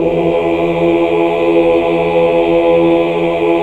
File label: OOH, Hallelujah